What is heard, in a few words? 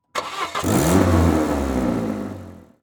Start the Car; this is the police pc